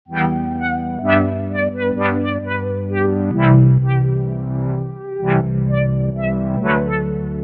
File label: Synth; WSN; FOCUS Preset Pack